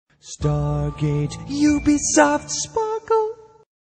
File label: sparkle; stargate; ubisoft